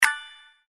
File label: SFX Image Select; slots; Panda Majesty2